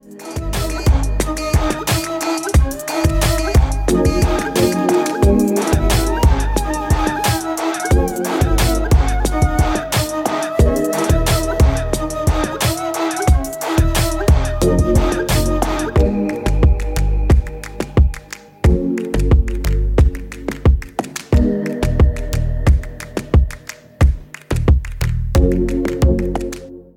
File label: Music Ringtone